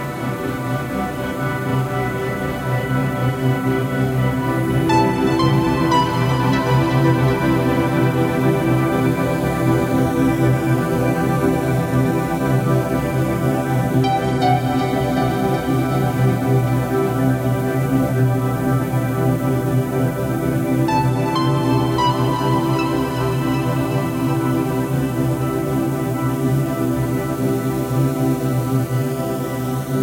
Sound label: song; loop path3